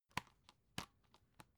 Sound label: dvd case open